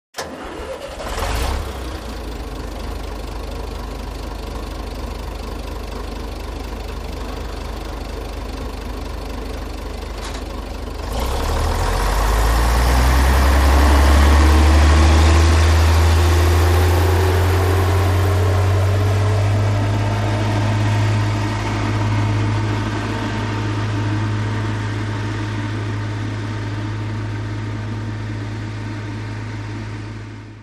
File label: Earth Mover; Earth Mover Start Up And Away